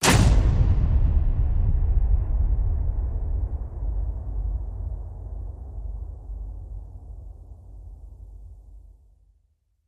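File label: hit horror salvo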